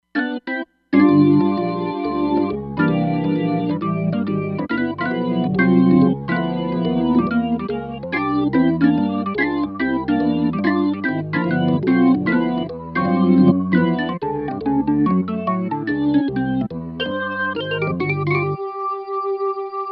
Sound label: Chorusd C, Roland Fantom XR